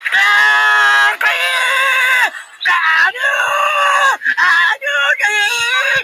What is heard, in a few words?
squawks dreadful song